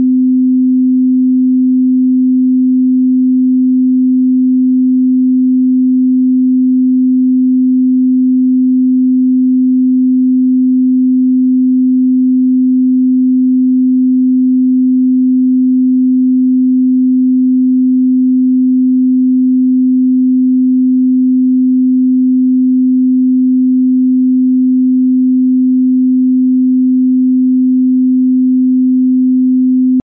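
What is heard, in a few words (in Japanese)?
256 Hz, 信号音; 5 オクターブ上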